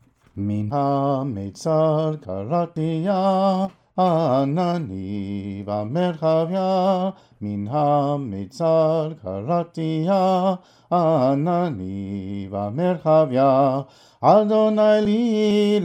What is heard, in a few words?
MinHameitsar sung